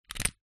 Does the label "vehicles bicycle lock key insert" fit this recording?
Yes